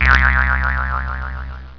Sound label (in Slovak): Struna; Revelation Sight and Sound SK